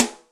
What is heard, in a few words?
Rock Rim, Electric Snare